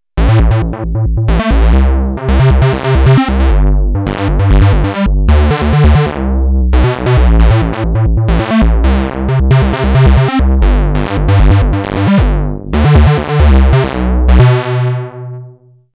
synth squared, Moog Minitaur; Super square; analog monophonic synthesizer; 2 VCO oscillator

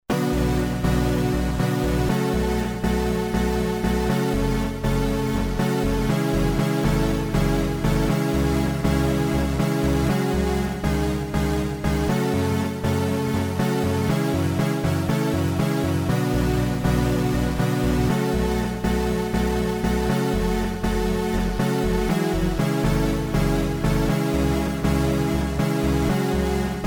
synth brassy, akai x 7000

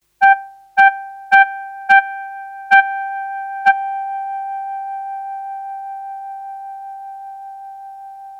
reverb, yamaha tx81z

